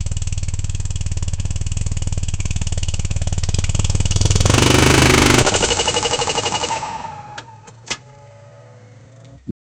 motorcycle quick gear shi

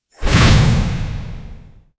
magical fireball cast

